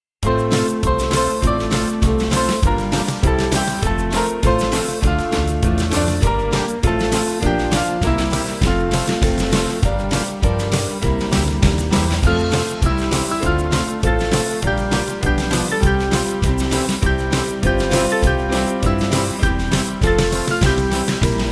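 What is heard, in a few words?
Music Backing Files for Churches; Fight The Good Fight; Shepton Beauchamp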